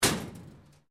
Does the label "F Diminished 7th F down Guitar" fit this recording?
No